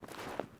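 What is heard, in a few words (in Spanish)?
Pasos zapato cesped, shoe footsteps on grass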